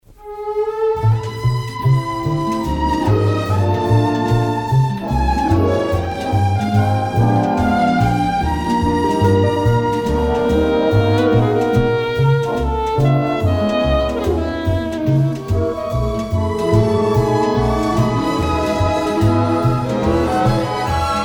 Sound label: Noxzema Background Score; Lorin Frank Productions